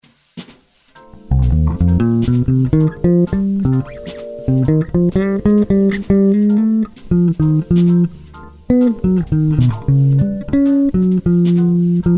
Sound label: Alterd scale